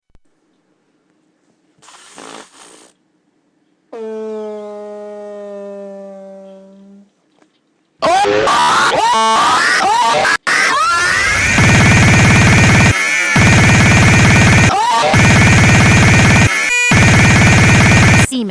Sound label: skype samples